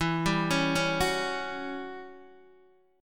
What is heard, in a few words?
E Minor 6th Add 9th, Guitar, DADGAD